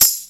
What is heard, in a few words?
TAM; E-MU Formula 4000 Series Vol. 1; Hip Hop Nation; Bonus Perk